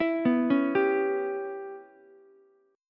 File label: Db Minor 7th Flat 5th Db down Ukulele; arpeggiated; JGuitar